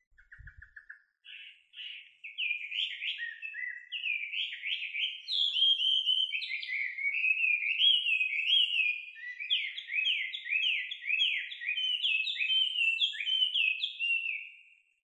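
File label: gabicho song, Chinese hwamei; saezuri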